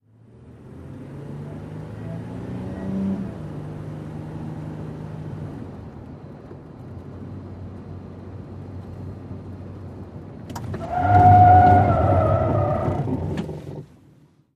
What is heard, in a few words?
SUV 180 Skid Int